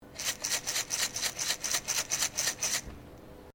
PEPPER GRINDER SHAKE; Various kitchen appliances; Household

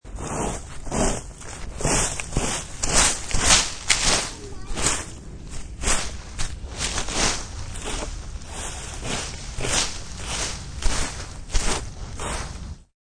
Rkng Lvs, Raking leaves in yard.; Yard Tools

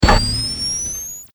Science Fiction Monitor Turn On Power Up; Computers (Sound Effects:Science Fiction)

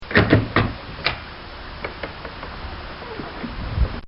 Hinged Stall Door Opening

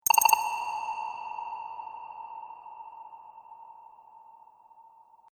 Game Cavern Tokens; Video Game Sound Effects

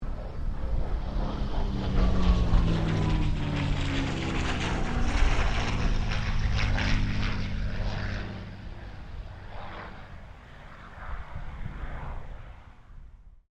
AIRCRAFT CA-18 MUSTANG PASS

